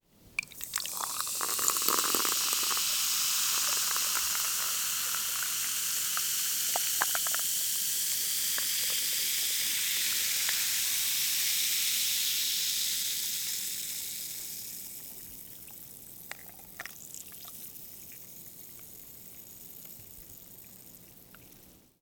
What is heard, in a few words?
Fire and food Pouring Soda